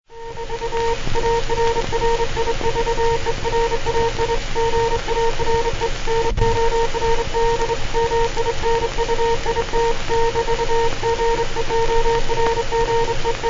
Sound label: fast hand key